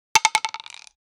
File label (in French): dice